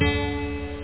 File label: guitar; Amiga 8-bit Sampled Voice; 8,363 sample rate